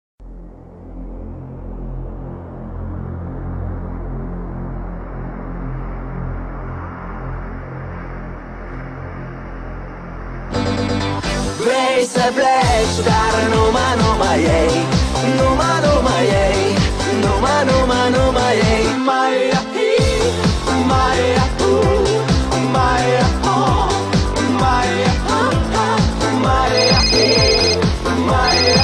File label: disco song dance